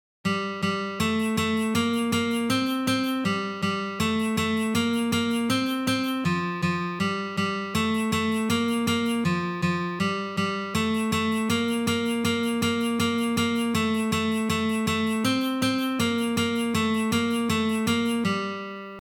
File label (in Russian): Uprazhnenie na peremennyj shtrih